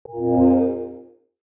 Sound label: Playstation Startup Sound